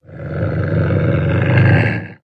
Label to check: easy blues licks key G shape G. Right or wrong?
Wrong